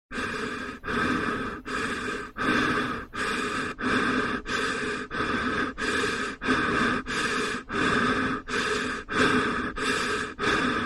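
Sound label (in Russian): Противогаз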